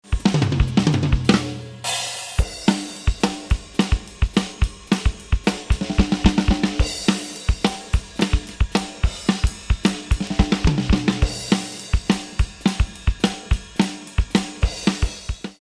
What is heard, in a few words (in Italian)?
rit senza mic ride e con mic ride, batteria